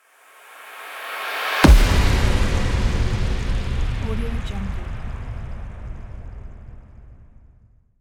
air long transition; music-fish

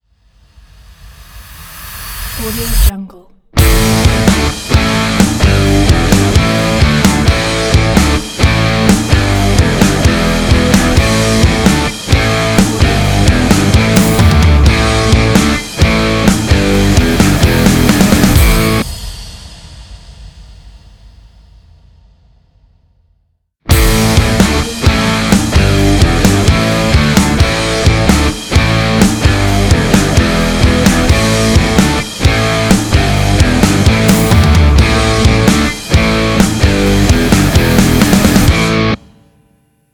heavy classic rock logo

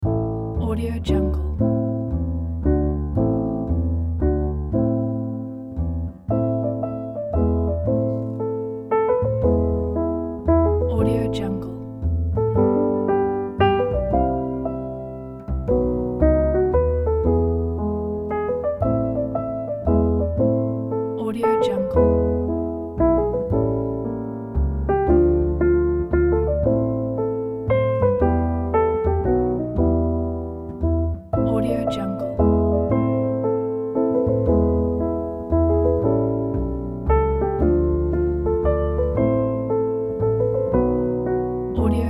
jazz in swedish; hyperprod

